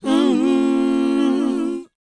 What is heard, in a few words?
WHOA G#, Vocal R&B & Dance; ILIO; Vocal Planet